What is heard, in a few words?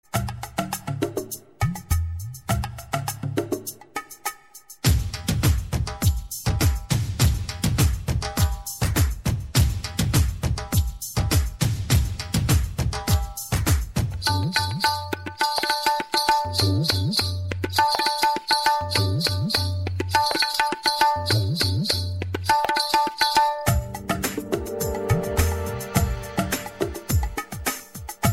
love rhythm, ringtones